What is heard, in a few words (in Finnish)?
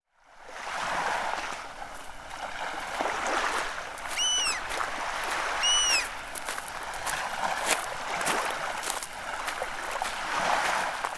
ranta